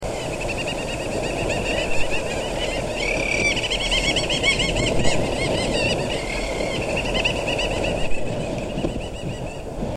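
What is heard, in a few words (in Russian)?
lyurik golos, little auk voice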